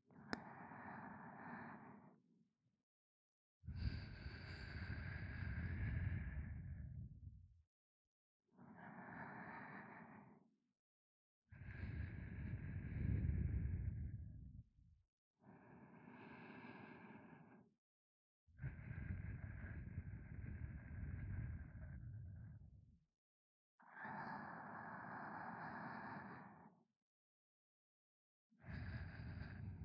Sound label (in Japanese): 寝息（左）